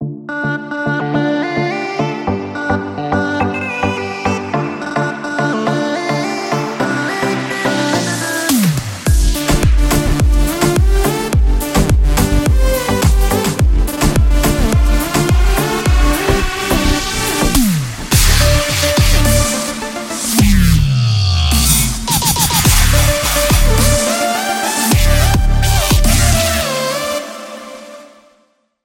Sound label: badass electro ringtone